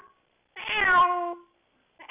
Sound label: cat meow